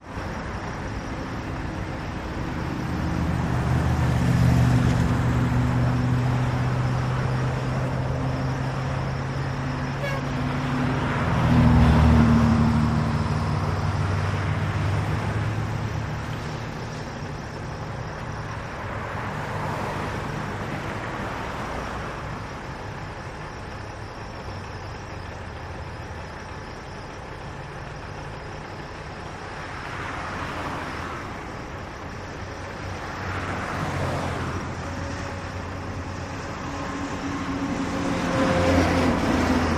Busy intersection with cars passing by and truck idle in background.